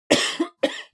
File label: human vocal cough female; smartsoundfx